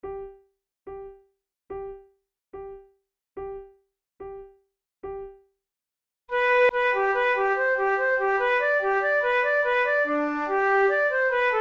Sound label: duet